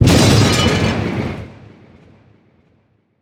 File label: thunder; schattenlander